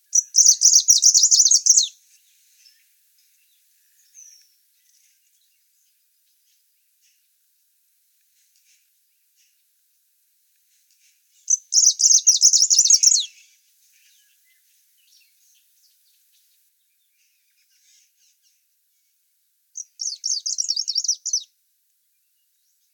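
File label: zelenaya penochka golos, greenish warbler voice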